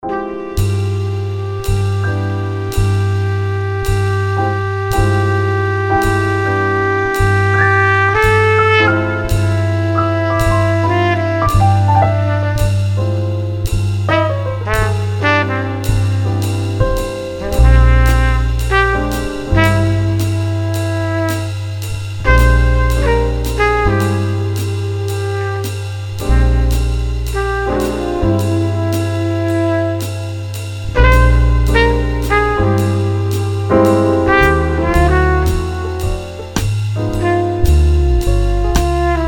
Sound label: Jazz Components